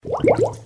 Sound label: bubble